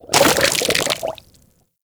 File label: Settlement Oil Bubble Burst; Warframe; Grineer Settlement